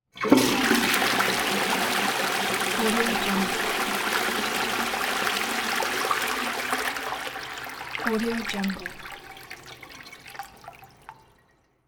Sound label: toilet flush